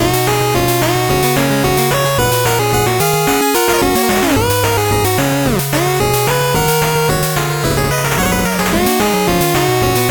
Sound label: 8bit bgm fast